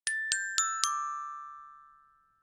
notification glockenspiel dry sequence descend

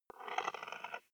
wood board scratch

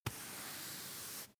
broom hand sweep slow

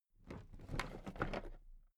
toolbox raise regular

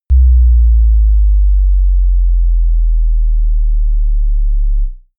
bass drop soft

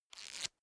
small book riffle through fast

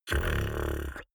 beatbox tongue roll soft damped

